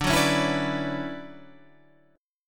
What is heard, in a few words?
D Major 13th D down Guitar Standard; strummed; capo 9